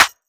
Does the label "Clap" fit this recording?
Yes